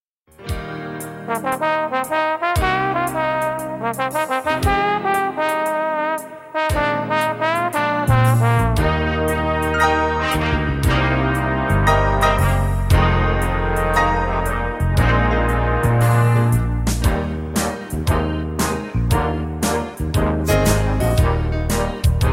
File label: amen brass connection